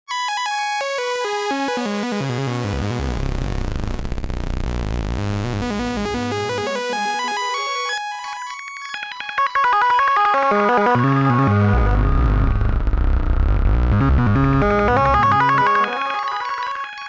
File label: highpass